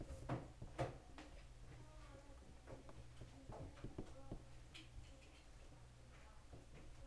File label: sea